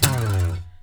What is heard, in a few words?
SLIDE, guitar; Spectrasonics; Hans Zimmer Guitars VOL-1; TAKA FX